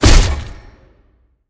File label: hit; smite; god tiamat